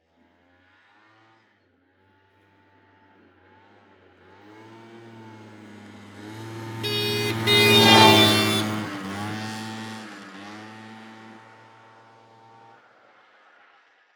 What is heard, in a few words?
Scooter Pass By, Piaggio Sfera 50cc; 94; SoundBits; Scooter Sfx